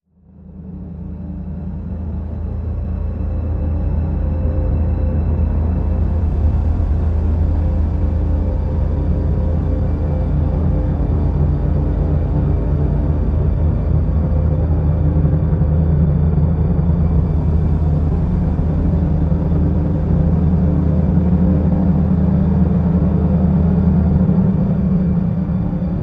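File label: Drn D, drone; Fathomage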